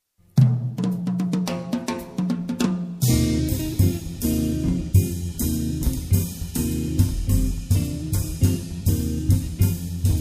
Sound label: Christmas Blues; Hacia Belen va una burra